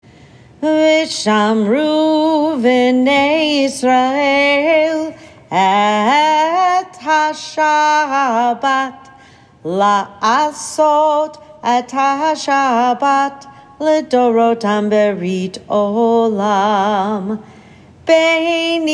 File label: Vshamru cong melody slow; trope class; bnai mitzvah